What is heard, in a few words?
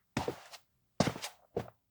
steps school stop; multiverse; anemoi